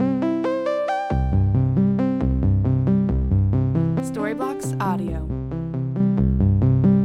Ambient House Bass Melody 136BPM A

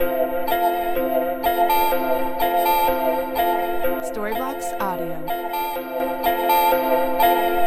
Ambient House China Pluck 125BPM C

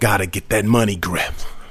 Rap Vox

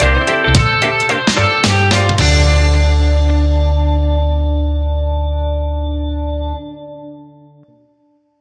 Hot Summer Reggae Music Stinger; bbc

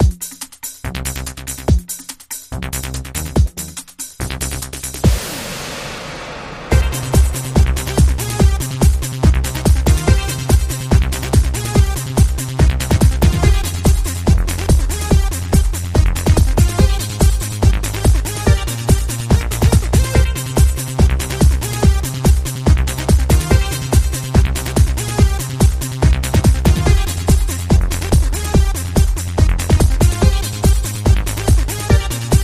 dancing beat

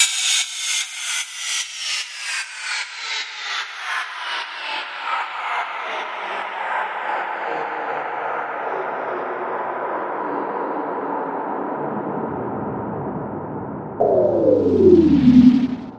SFX 120 C; Beach Day

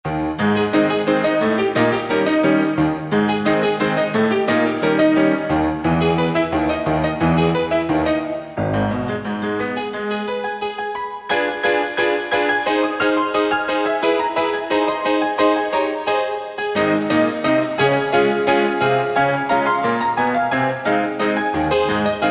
maple leaf rag

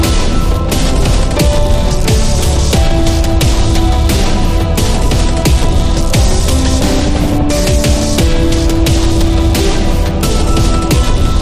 Modern Digital Orchestra; bbc

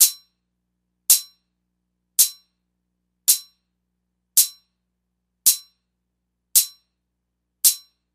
Ambient House Hat 110BPM

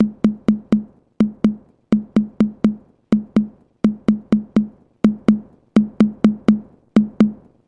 Ambient House Tom

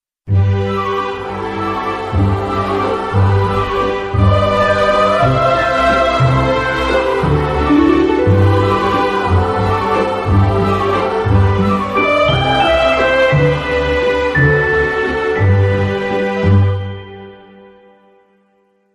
Nutcracker Waltz of the Snowflakes

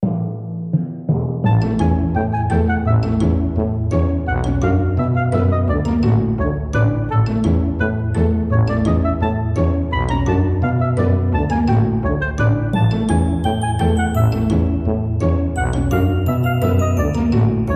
circus tentative alt mix

